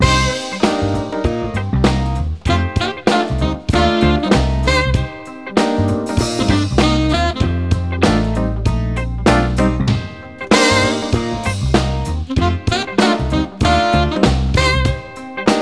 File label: Jazz